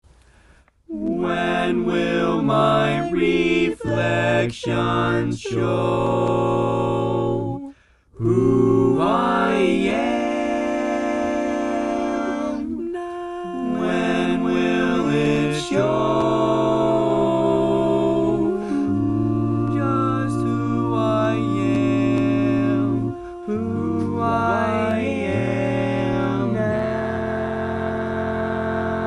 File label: Tenor, barbershop tags